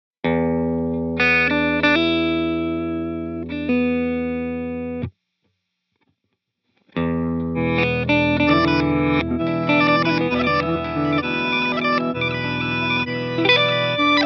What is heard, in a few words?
Reverse Reverse Dual Pitch